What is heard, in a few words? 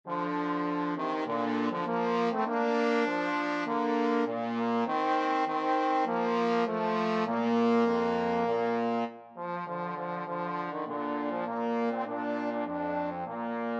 trombone duet